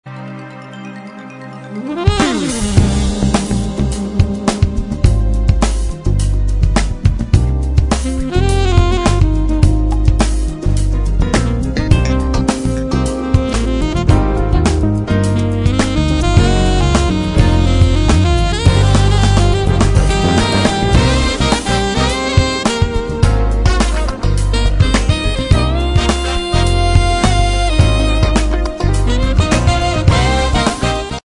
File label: groove